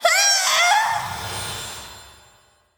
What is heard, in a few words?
Jade Elemental Death